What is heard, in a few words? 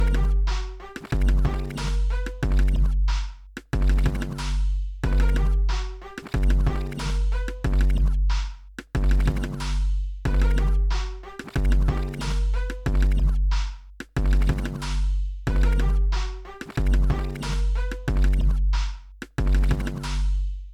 Beat